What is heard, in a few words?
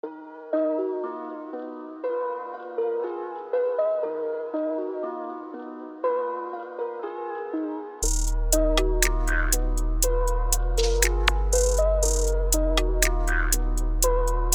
Trap beat